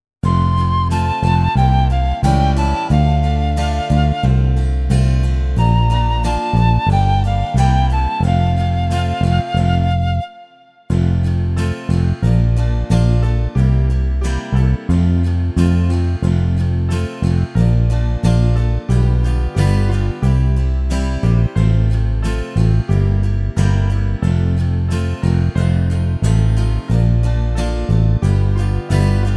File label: Karaoke